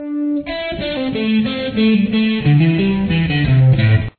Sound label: lick, guitar